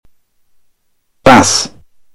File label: bass